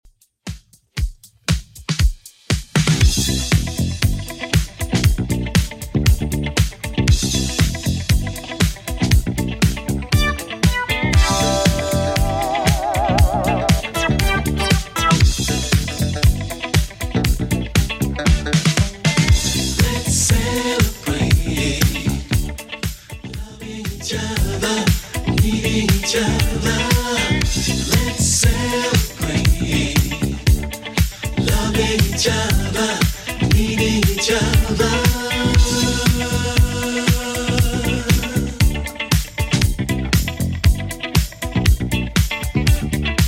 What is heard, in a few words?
80s Redrum, 118 bpm